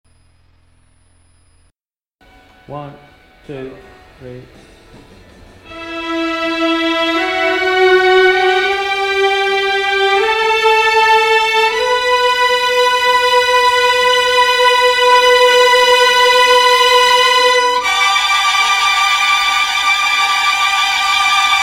Abbey Road Strings

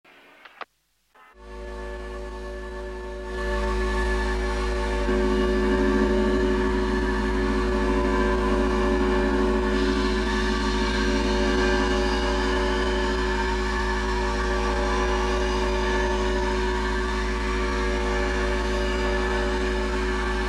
Transposing Noise sketch

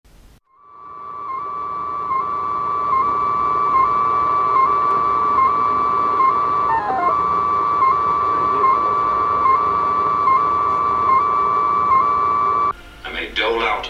Radio chaser noise

